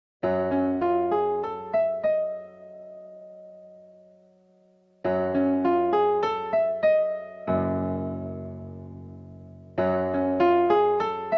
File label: suspense; lyoko